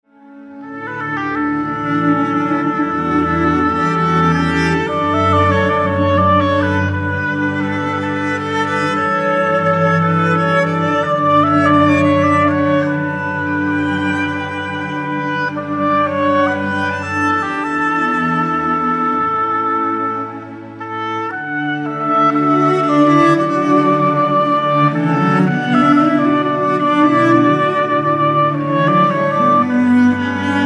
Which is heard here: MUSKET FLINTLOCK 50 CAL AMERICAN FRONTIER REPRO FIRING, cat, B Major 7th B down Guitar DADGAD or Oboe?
Oboe